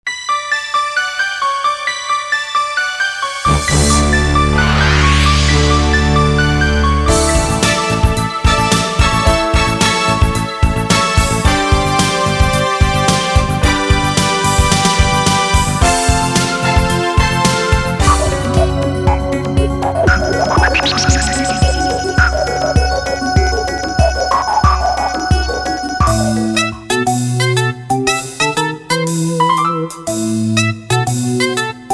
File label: jingle